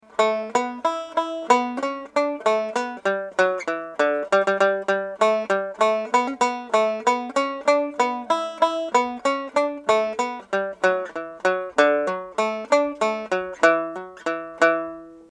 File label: banjo